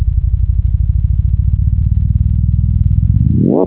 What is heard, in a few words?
plus polarization, waveform; Inspiral; iota 60; Viewed in the equator